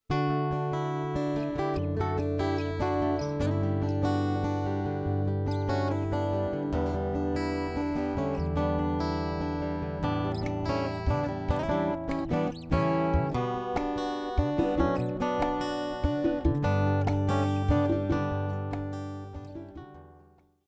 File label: new age